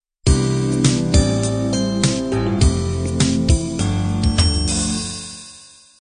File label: color beat intro